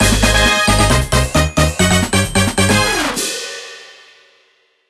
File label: Mini-Game Win; Mario Party 2